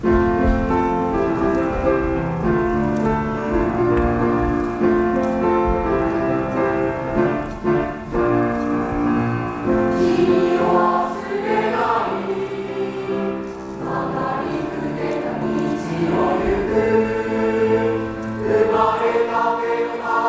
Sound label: Chorus Club